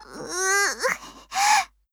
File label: combo break; osu